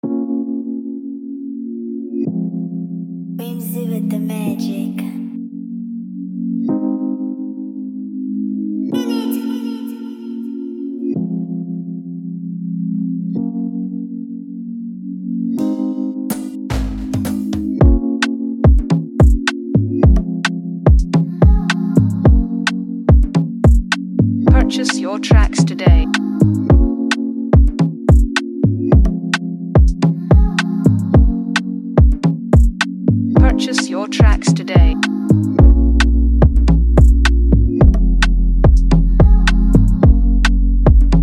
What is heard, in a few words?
Afrobeat Instrumentral